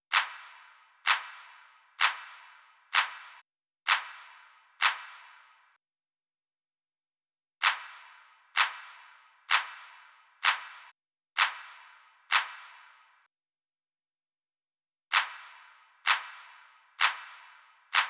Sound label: Drum Loop Clap Add, 128BPM; VEC5 Drumloops; VEC5 Multi Loops 128BPM; Loop Elements